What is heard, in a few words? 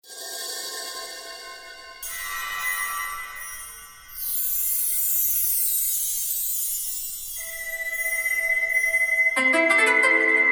CHILLOUT COMPOSITION; Original compositions